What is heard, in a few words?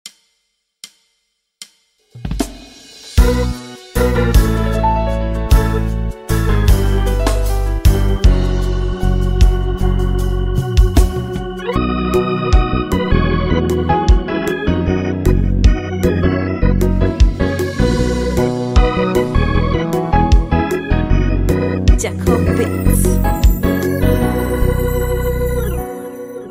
Choir